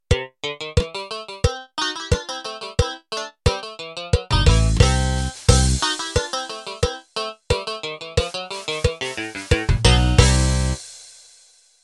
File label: Newgrass End